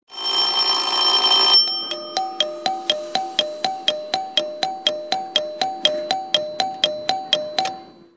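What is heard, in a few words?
JR ATS bell chime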